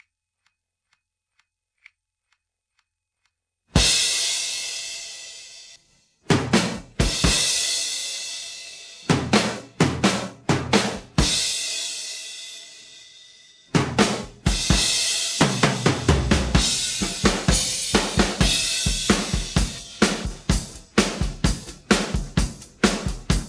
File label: drums pattern